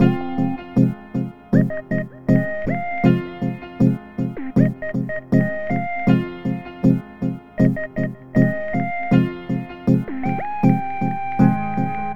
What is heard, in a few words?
Melody, Hip-Hop